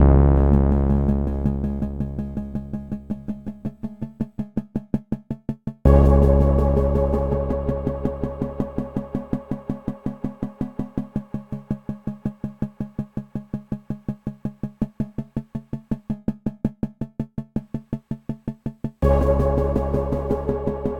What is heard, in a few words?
Hacking Loop 164 Bpm; Dispatch; PS5, Windows